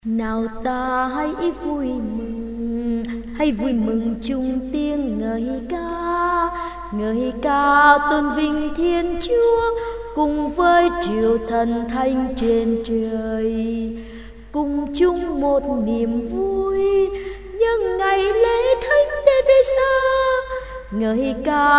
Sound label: alto basso